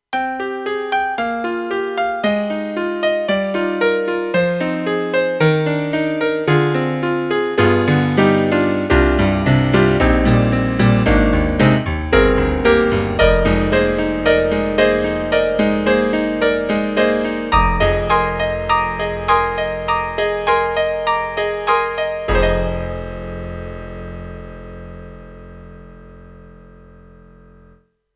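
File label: YAMAHA TG100 Grand Piano 2; DiskOrchestra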